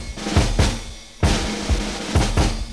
Defeat; Peripheral Empires

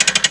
blip; outlaws

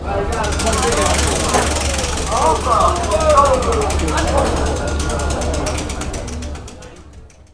spin whel; Digital Sound Gallery - Volume 1; fun game

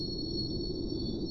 gearbox; Cross Racing Championship

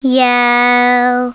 YELLS

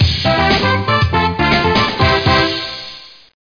tune; Tempra Media; vision; Creative Voice

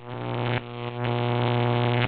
Electricity quiet; PowerTalk Tour; Connectivity

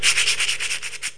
SCURRY

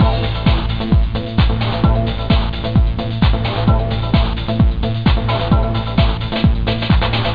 trance mix

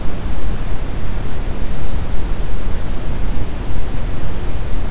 noise brown

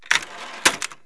carriage, office, type; KABOOM! - Wild and Wacky Sound Software

